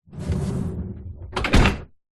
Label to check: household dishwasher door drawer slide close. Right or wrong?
Right